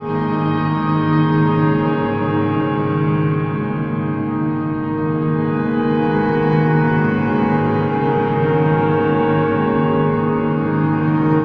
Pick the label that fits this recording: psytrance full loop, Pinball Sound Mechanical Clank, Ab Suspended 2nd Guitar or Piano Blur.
Piano Blur